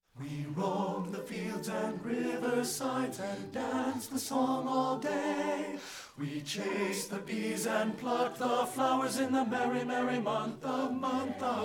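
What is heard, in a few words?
madrigals, tenor; STEPHEN FOSTER MADRIGALS 2; partbypart; satb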